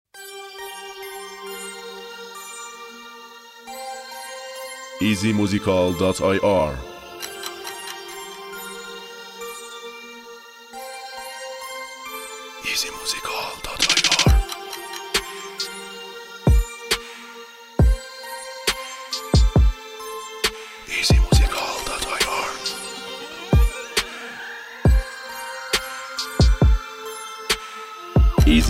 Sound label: Beat